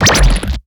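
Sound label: attack cat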